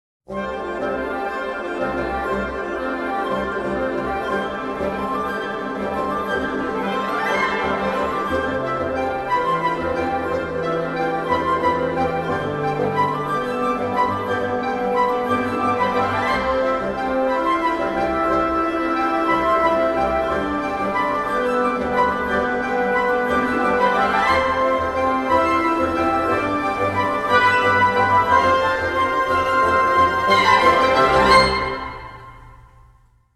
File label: Woodwinds